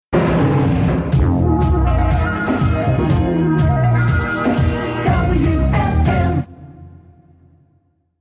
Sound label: Jingle, radio